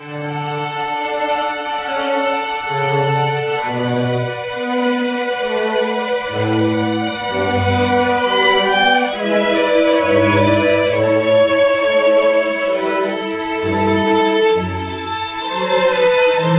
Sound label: air, violine